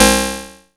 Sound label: BRIGHT, PERC HITS; Zero-G Ambient 1